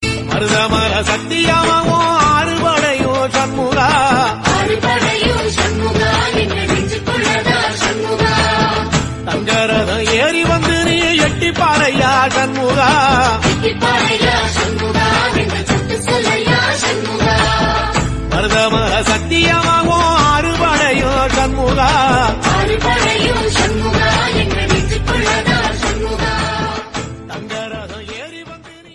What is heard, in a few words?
Tamil Devotional Song Ringtone